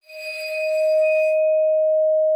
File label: ARCO D#5, VIBES; Xsample VOL-4